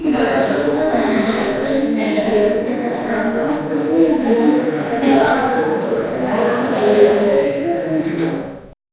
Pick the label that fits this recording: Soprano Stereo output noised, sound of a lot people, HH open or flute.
sound of a lot people